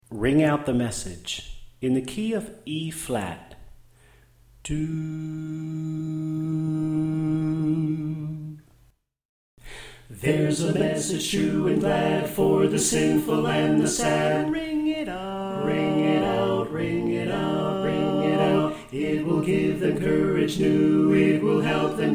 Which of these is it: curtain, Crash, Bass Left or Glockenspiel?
Bass Left